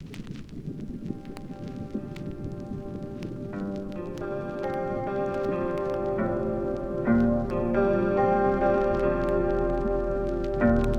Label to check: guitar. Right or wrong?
Right